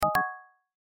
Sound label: chat notification default